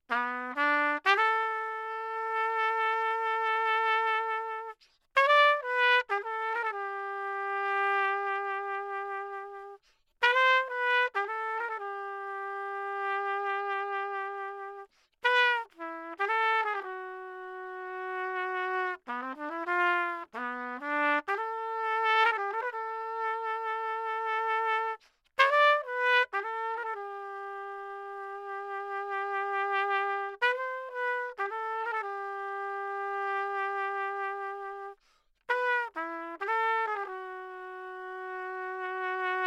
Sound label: trumpet; lewitt; lct940 fet